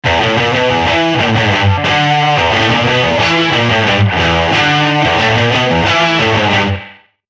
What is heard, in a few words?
pawnshop combo, guitar amp; guitar amp designer; logic pro